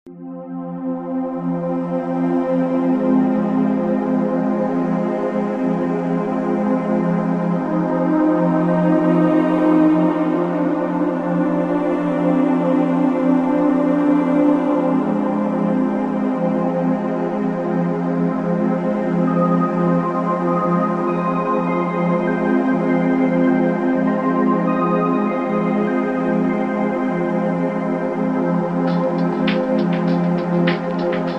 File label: music bar; Ioncross Total War